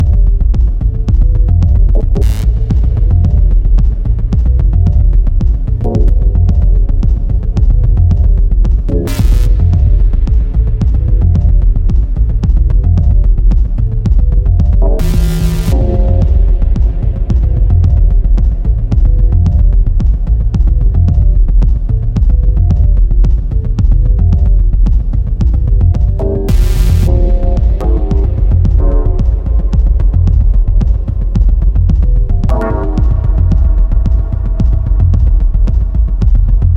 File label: Jazz Radio